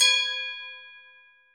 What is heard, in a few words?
bell G#7; osu